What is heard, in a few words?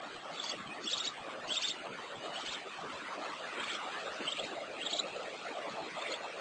Bulbul Red Eyed juvenile calls; Amar